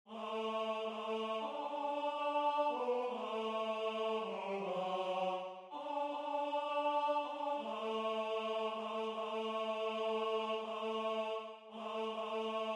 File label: Tenor; Joy to the world